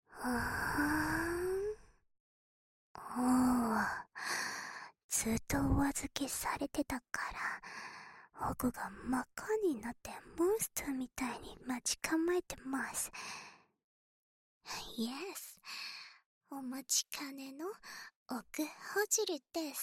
【左】奥耳かき; 耳かき小分けトラック

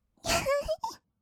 きゃはっ, kawaii voice; 1200 kawaii voice!!; 色々なワンショット

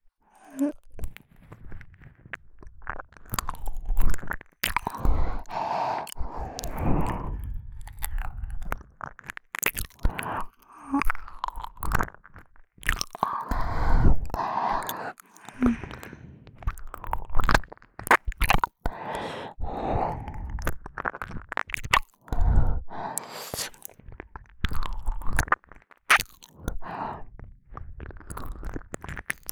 じゅぽじゅぽ（耳奥）, ASMR, 右耳

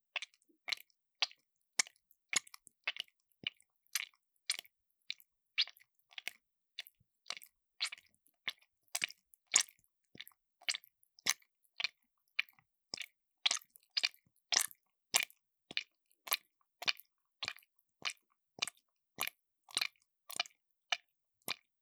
クチュ音 ノーマル

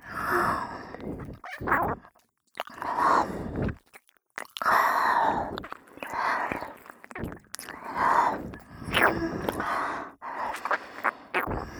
左耳舐めループ 通常ver, ASMR

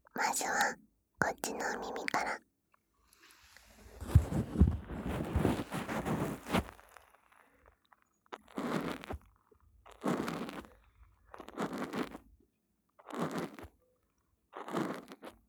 ステンレス耳かき R

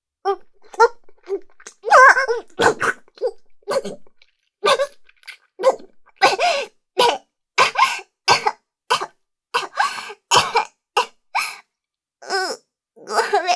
口の中に出す(むせて吐き出す)

